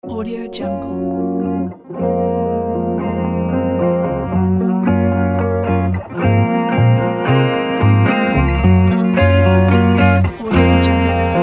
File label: soul guitar trap beat